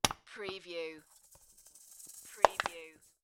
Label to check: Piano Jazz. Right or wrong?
Wrong